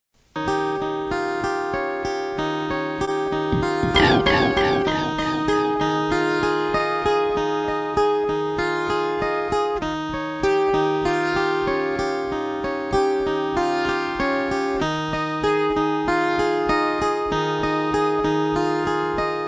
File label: Instrumental